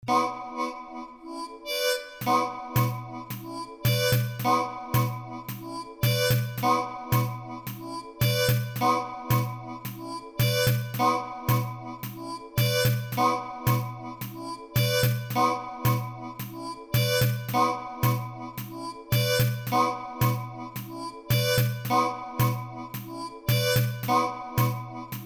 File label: harmo rythme